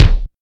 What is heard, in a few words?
focused kickdrum c key, kick drum sound tuned to the C note. Loudest frequency: 461Hz